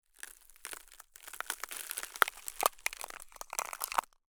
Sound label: crystal shatter